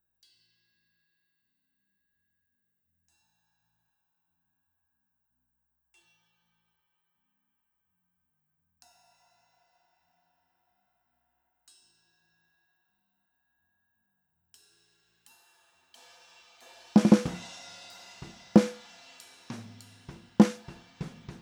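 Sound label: SM57 Snare Drums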